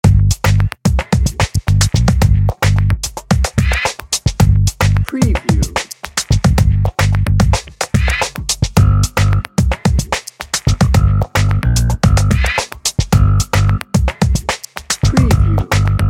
modern fashion dnb

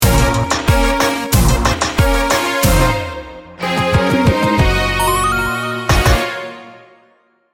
reggaeton seduction sting bumper

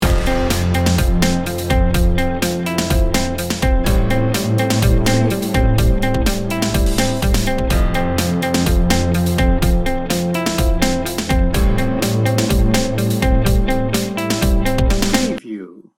loop

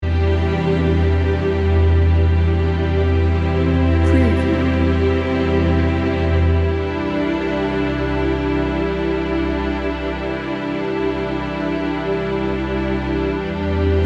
peaceful dreams loop

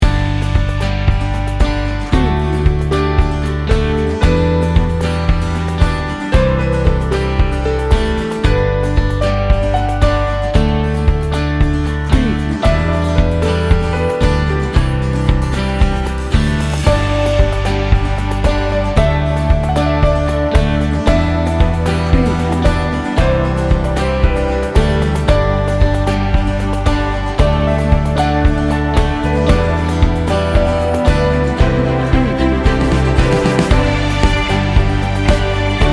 inspiring uplifting soft pop

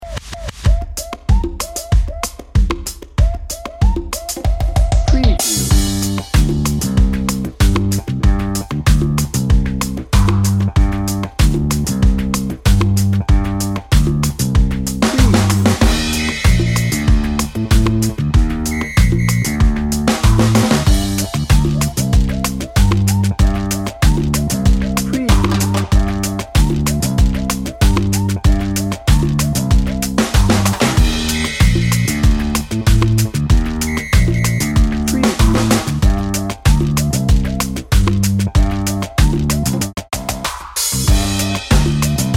samba party dnb

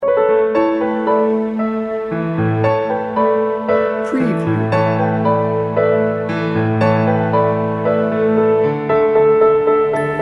breezy summer day

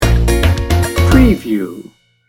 samba shoes sting bumper

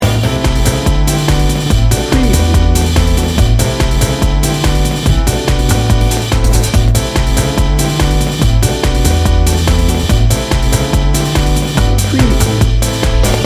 city life gritty upbeat loop

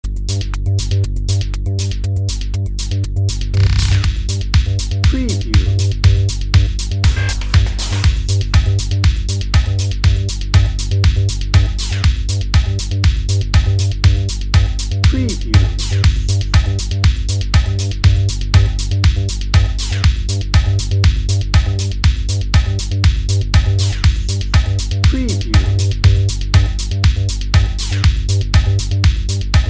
technology pulse dnb

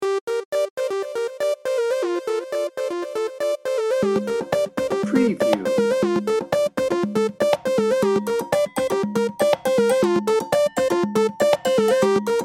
tropical salsa party bed

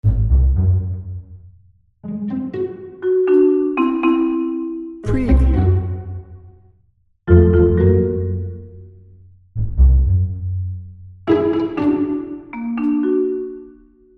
quirky pizzicato

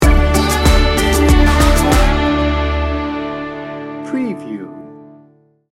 heartland americana sting bumper

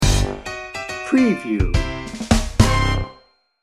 buddies sting bumper

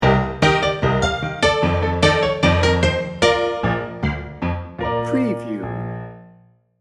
silent movie rag sting bumper

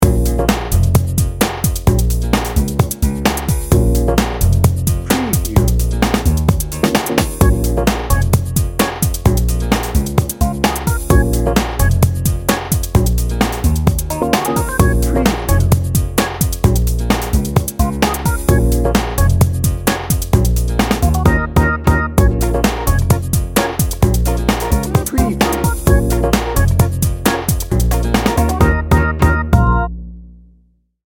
party set

